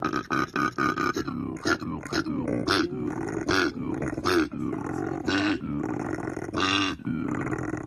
Koala Sounds

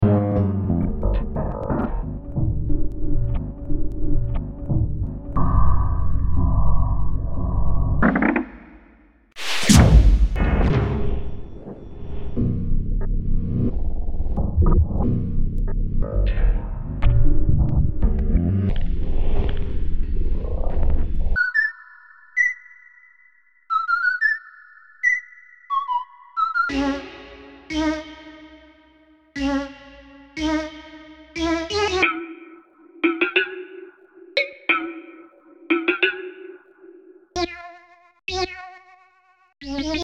Experimental Downtempo

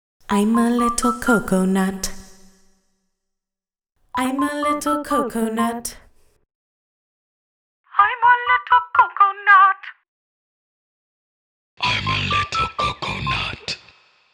Little Coconut Song (Vocals and Sounds)

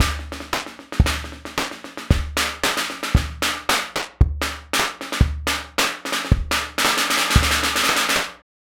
114 Fife N drum dry

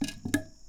perc

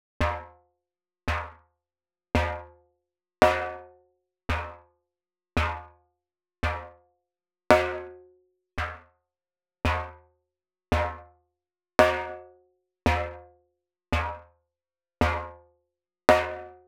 Snare

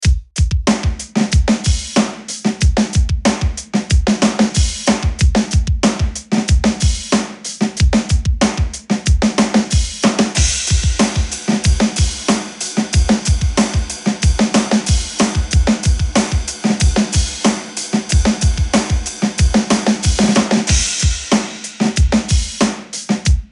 Drum Track

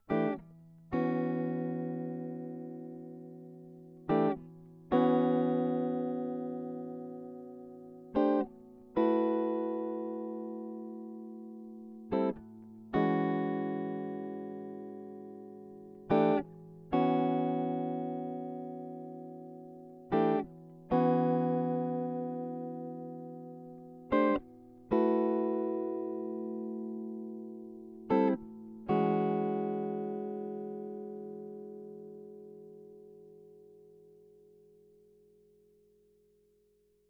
jazz riff E 120

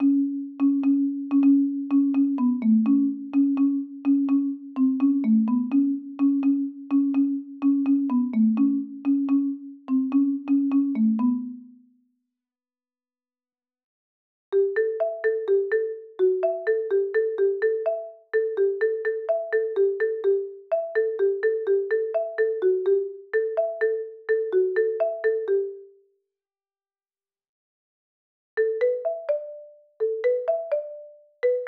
Marimba

